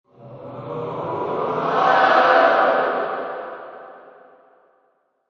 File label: Kick Off Yell, Basketball Chant KickOff. Indoor Sports: Crowd voice rising; kick off chant. Reverberant sound.